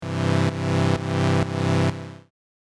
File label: SIDECHAIN WET